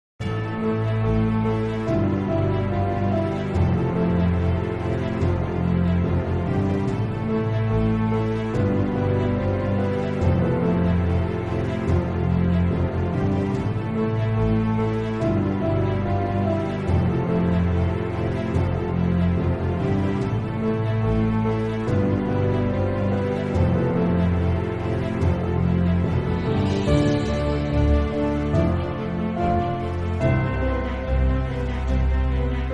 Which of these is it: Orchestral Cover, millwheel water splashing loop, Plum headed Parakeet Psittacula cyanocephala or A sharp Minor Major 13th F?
Orchestral Cover